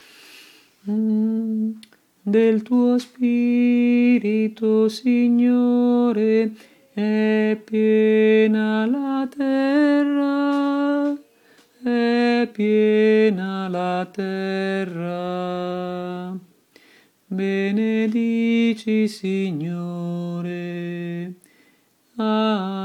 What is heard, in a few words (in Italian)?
del tuo spirito tenori